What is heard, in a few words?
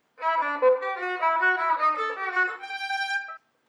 Riff